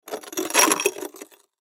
Axe Scrape Broken, Axe Scrape Broken Windshield Fire Department; Emergency